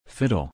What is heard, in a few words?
fiddle; Saint Patrick